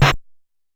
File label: Snare Lo, Stylophone Beatbox